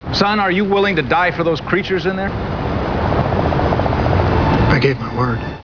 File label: creatures; farscape; human